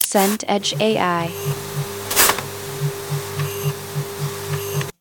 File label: printer inkjet page